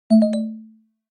chromebook low battery

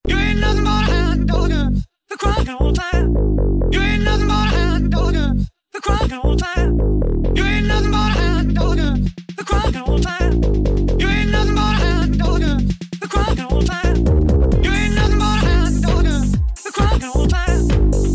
remix

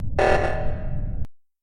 alert buzzer; among us

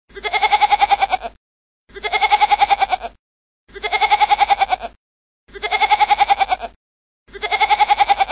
goat noises

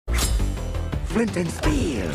flint and steel

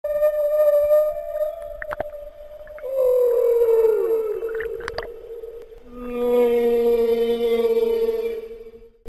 whale talking

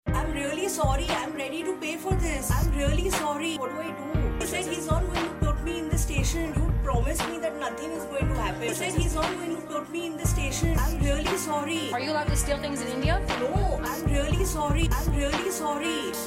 remix; indian lady shoplifting in target

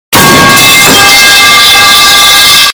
very loud metal pipe drop

